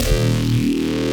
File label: E Bass Shot; neuro; BIG5AUDIO